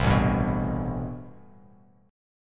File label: A-Minor 9th A Bass Standard; strummed; JGuitar